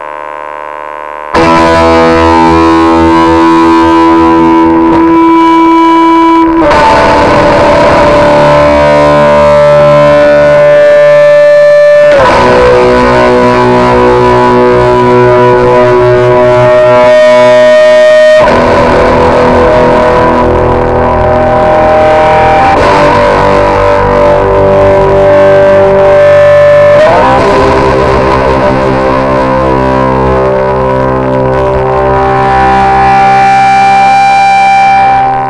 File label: metal